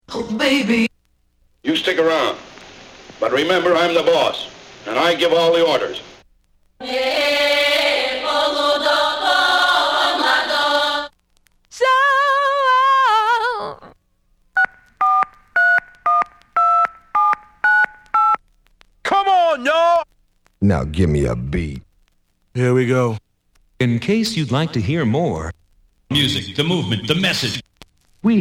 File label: samples scratches; wicked mix vol 013